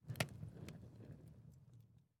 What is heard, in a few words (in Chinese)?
熔炉燃烧噼啪声